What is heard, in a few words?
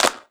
Clap Med